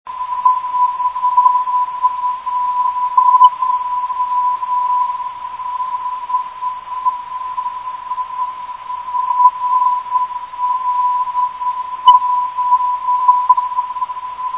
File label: flo 270khz, NDB